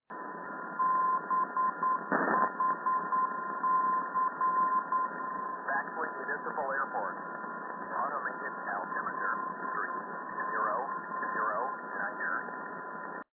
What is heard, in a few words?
376khz, ndb; lf